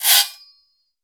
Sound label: STEEL